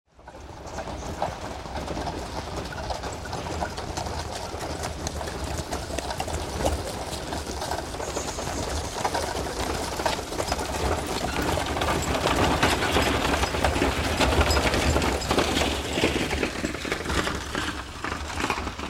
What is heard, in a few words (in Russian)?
prohod kavalerii soldaty na konjah